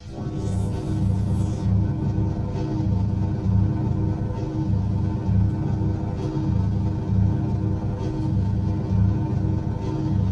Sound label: drone thing; weeklybeats-2016